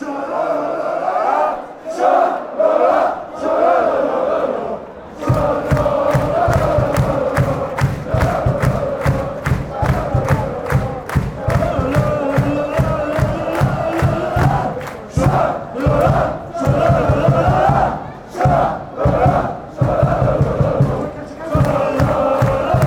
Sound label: schalalalalala, fanchants